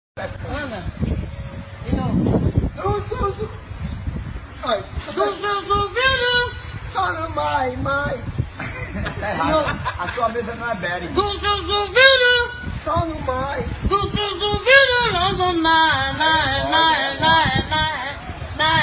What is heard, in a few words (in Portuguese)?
senhora arrassa cantando